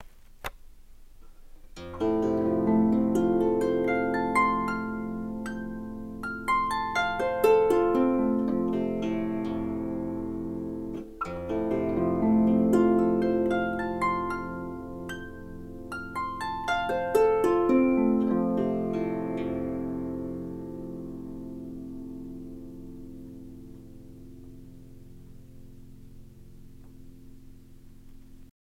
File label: Arpeggio, Harp; Rebecca